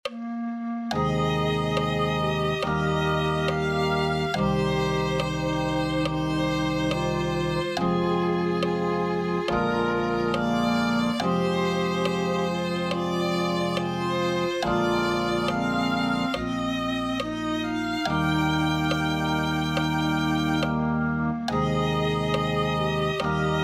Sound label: violin